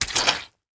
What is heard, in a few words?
SFX towel stepping on wet towel